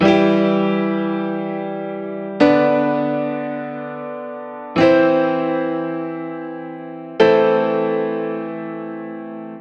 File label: Air Piano Chords, metal; Метал